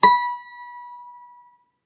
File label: string 1 fret 1